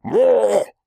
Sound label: Angry; Amanita